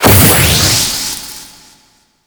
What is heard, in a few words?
Space Pod Thruster Burst; Grineer; Vehicles; Warframe